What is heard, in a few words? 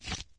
flesh, footsteps, run